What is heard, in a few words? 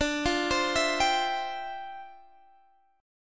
D Minor 11th D down Guitar Half Step Down